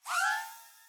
Drone fly; Ion Shift

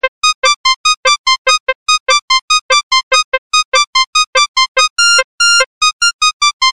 Ring Tone (Mono); Smeshariki: Kaleidoscope of Games

